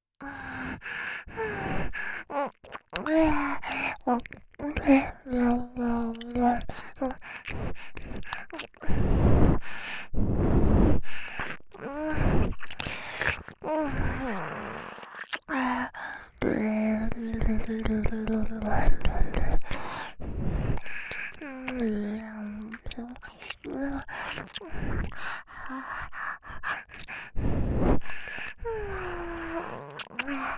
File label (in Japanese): 鼻息耳舐め編 (妹のみ)